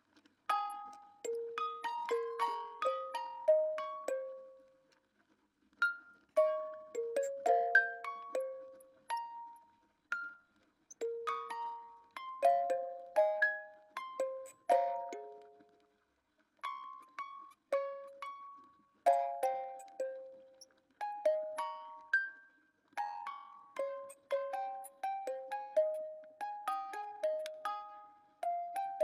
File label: musicbox doubletime reverb